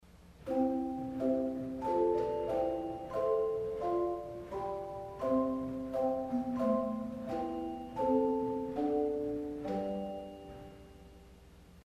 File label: Harp St Anne, organ stop; CulverAcademy